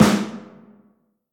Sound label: wet acoustic snare g sharp key